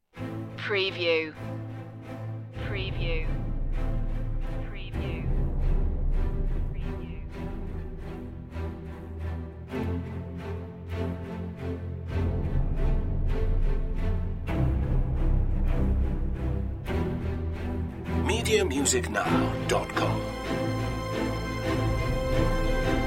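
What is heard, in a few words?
no choir no percussion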